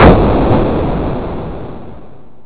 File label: explode; Yarozians